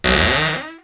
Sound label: chest open; Untitled Maze Game